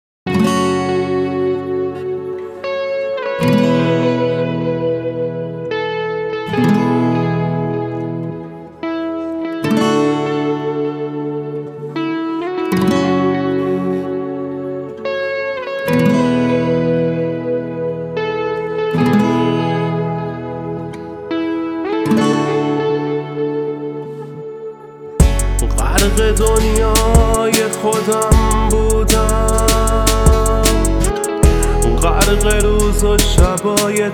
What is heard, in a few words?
Melody pop